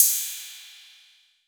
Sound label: PERC 808